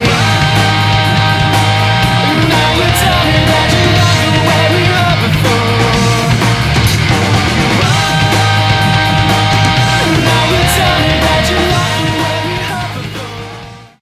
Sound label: Stinger; DFH Stadium